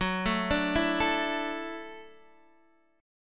F sharp Minor 7th Sharp 5th F sharp, Guitar DADGAD